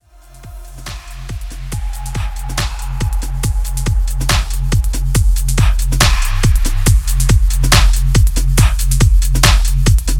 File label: DUBSTEP